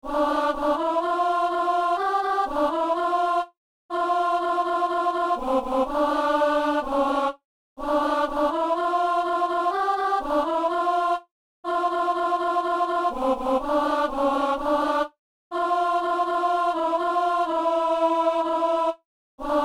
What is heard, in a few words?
alto